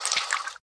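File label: howler monkey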